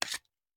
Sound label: Hand Gun Foley; Sound Ideas; Guns